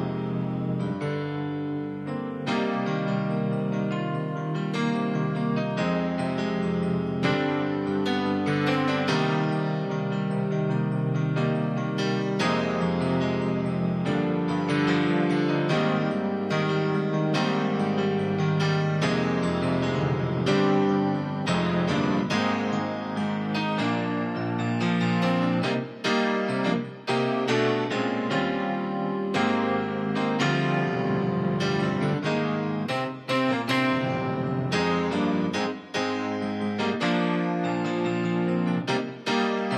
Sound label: PIANO